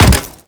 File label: Step Default; Warframe; Grineer Leto